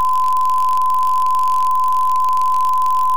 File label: bad signal